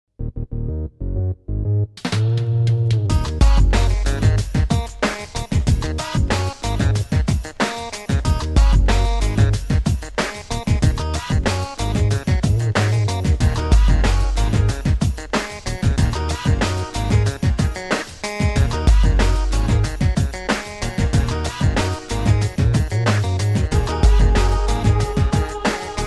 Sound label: contemporary urban pop funky house